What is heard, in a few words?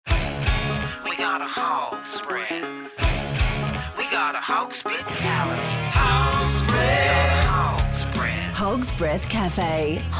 jingle